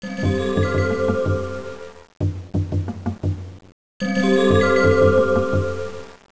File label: song; trippytable